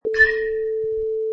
Baseball Hit with Aluminum Bat

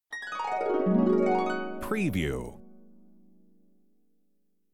dream harp

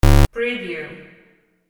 beep

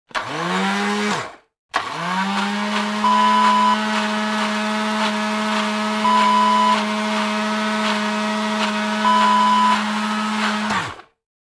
food mixer

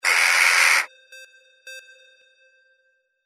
CROW

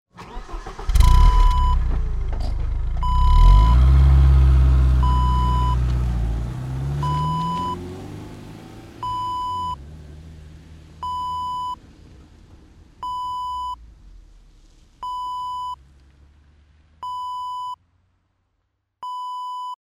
car start drive away

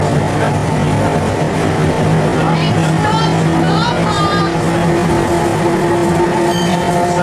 tramcar

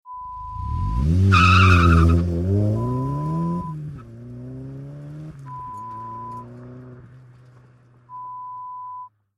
Car pull away

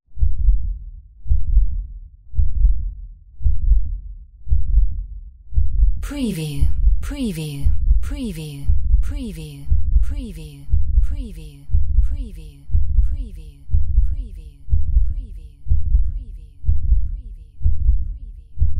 heartbeat increase; windy beach audio

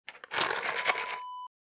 candy box shake

